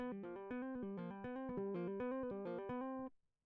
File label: electric